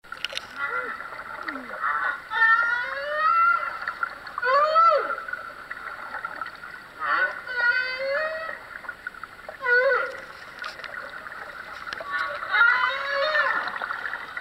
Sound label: humpback whale song